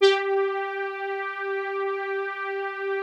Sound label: Prophet 10 TALK; Greytsounds VOL-1